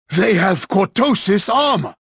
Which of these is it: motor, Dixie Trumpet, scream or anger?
anger